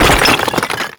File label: skeleton spawn; weapons; dota; creep; neutral